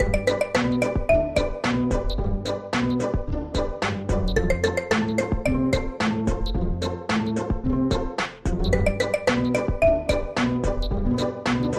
music cheerful; bgm

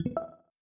wrong answer

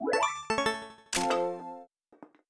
right answer effect

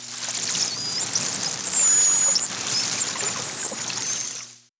dolphin

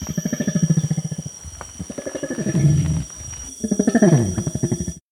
orangutan

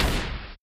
fire shot

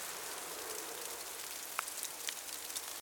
snowfall weather

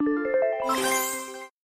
game complete effect

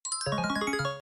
right answer effect

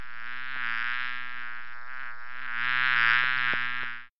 bee

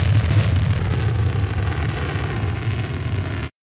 space shuttle

